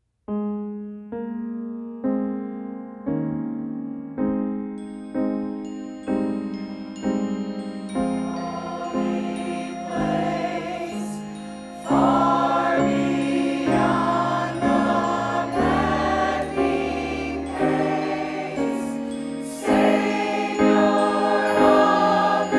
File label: Choir; Sunday Morning Worship